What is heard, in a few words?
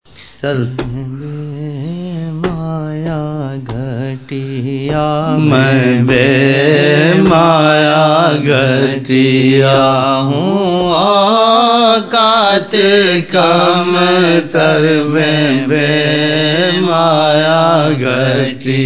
naat; main bay maya ghatiya hoon auqat kamtar magar wah ree qismat woh aaqa saw hamaray